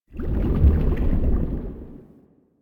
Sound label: Water Rumble; Warframe; Caliber Chicks minigame; environment